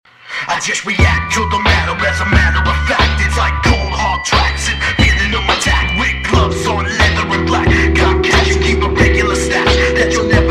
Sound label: Bass